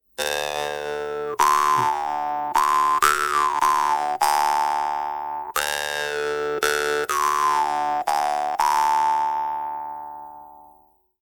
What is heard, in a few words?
doromb standard